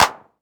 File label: CLAP & SNARE